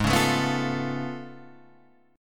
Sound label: A Augmented Major 9th G sharp